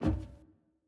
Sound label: Footstep Metal Stairs